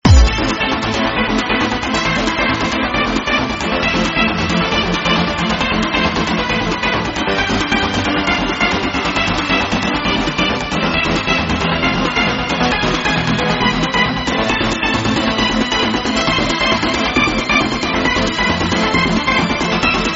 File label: Electro